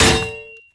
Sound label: cleaver hit world; tf2